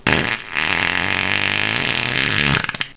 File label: mobile polyphonic ringtones